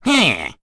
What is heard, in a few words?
Sigh; Bernheim; kings raid; en